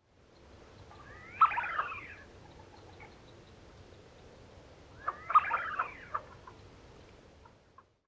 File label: Male Tufted Duck